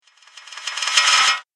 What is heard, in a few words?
Metal Stutter Sound; Andrew Fleming; Twistedtracks